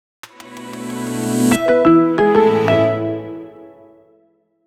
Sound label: Jingle; IDFM